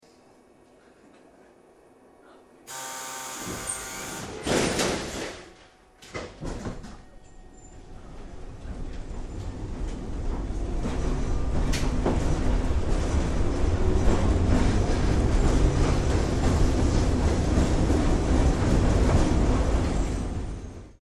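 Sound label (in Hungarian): door close start, villamosok; villamosok zörejfelvétele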